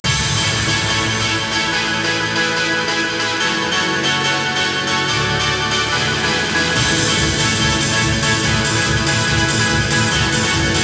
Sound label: Str G#